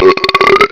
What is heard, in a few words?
berp